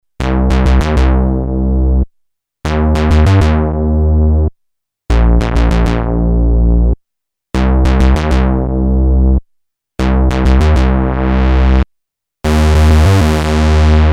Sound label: bass, minimax; Creamware minimax